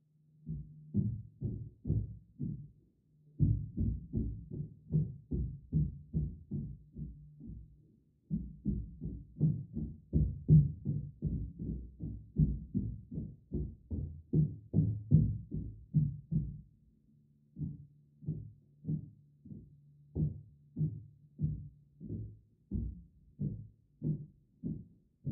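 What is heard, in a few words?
Footsteps Female Stomping, stairs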